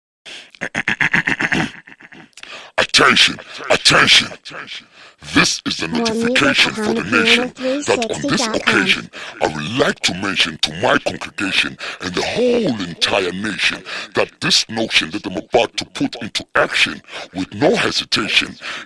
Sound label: Attention Intro, kwaito